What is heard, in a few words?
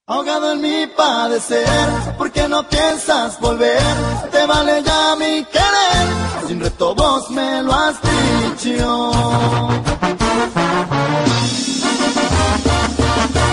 ringtone